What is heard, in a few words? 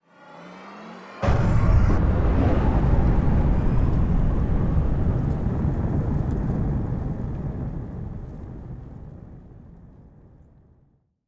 Ship Thrusters; Entry Seq; Skybox